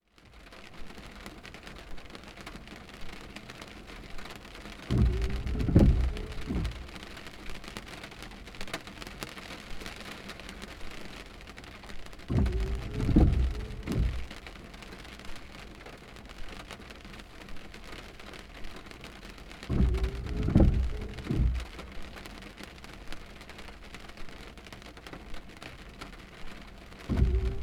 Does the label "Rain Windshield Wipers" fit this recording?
Yes